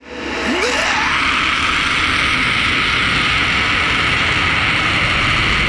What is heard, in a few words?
piccolo powerup; db mod 15